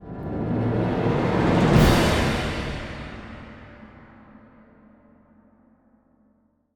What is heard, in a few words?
Combat Outro; The Witcher 3 Wild Hunt; Toussaint; Bruxa